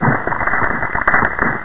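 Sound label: crumble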